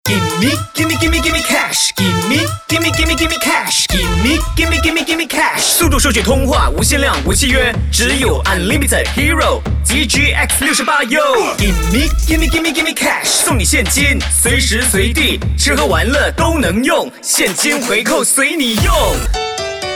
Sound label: Mandarin - Rap